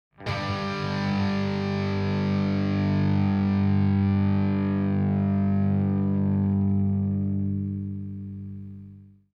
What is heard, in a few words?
power chord